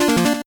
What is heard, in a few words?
8bit se